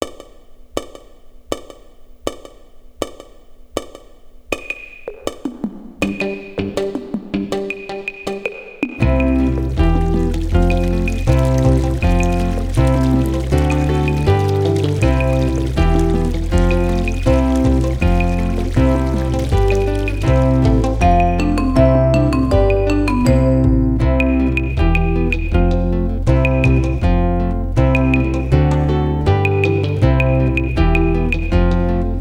Instrumental

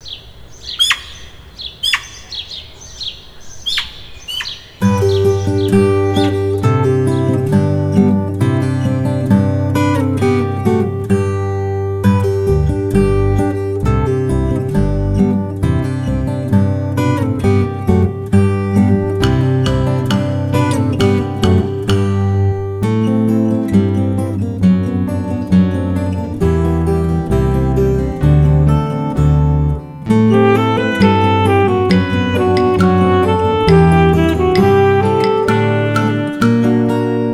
Instrumental

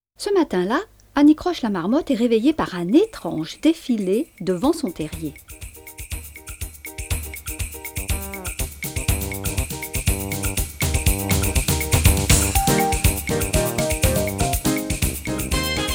Des percussions pour le carnaval

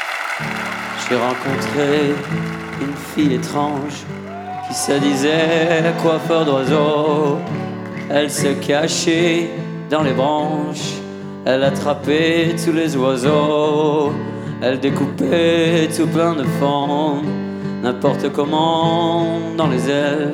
Live Electrique

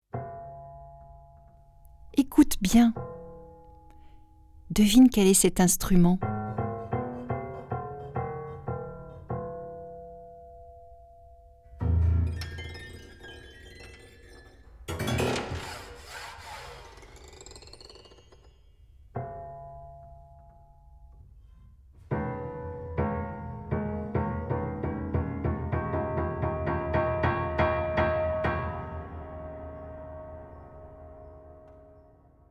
Le piano

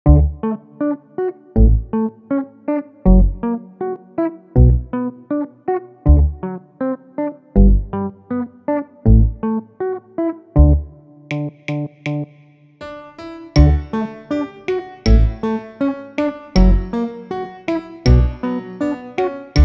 Brosse tes dents (Instrumental)

